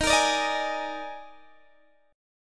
D sharp Major 7th Suspended 4th, D sharp, down, Guitar, Open D